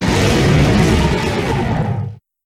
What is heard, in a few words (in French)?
Cri